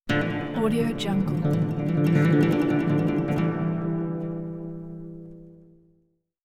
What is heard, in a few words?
acoustic guitar legato